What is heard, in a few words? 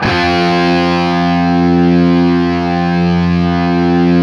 5TH F looped, Guitar; East West; Steve Stevens Guitar Samples Collection